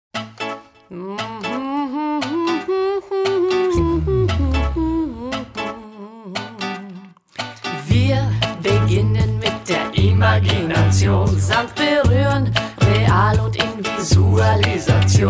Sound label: reggae